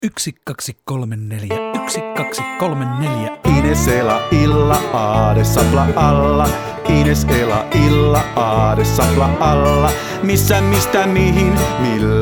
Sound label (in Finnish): paikallissijat ilman rumpuja; alkulasku